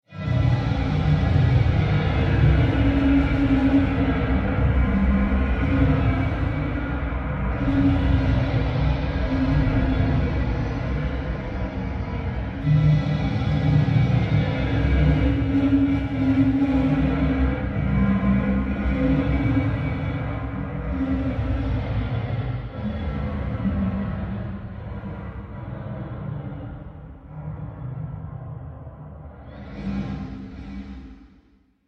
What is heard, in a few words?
interlude; Twinkle Elf